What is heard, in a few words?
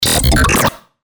BREAKER